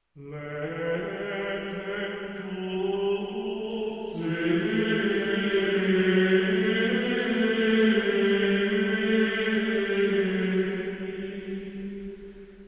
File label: Choralschola Offertorium Laetentur caeli, Gregorian chant